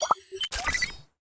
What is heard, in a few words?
multiplier count up; Ben 10 - Spot The Difference